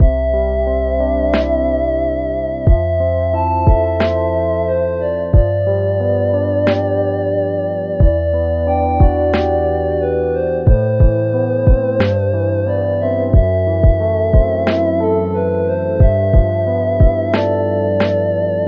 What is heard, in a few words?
Remix; Mother 3